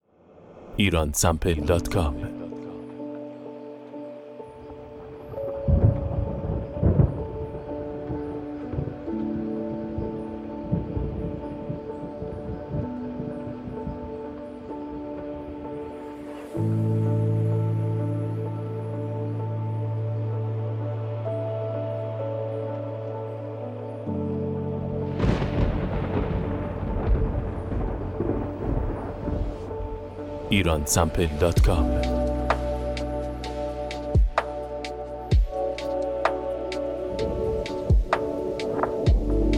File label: thunder; Iransample